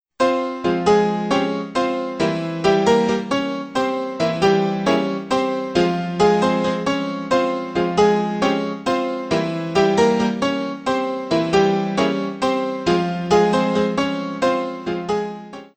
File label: piano